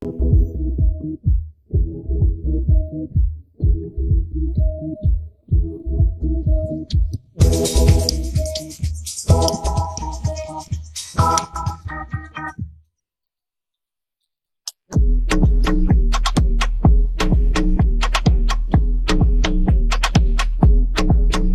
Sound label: rap accompaniment; covid fortnite